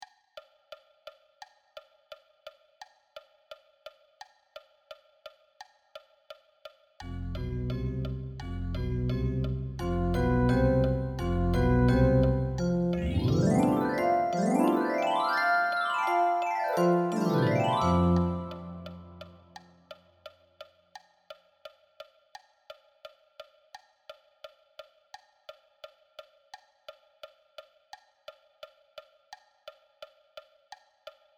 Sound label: Synthesizer, marching band